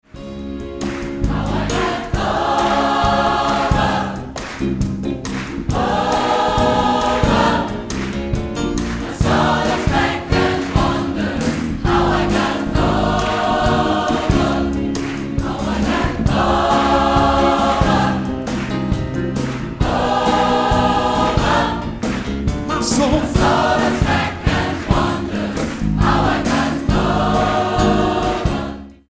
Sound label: chorus